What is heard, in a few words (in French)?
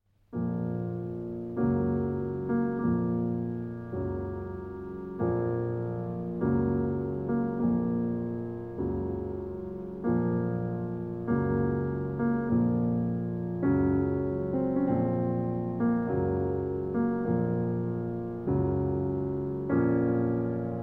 marche funebre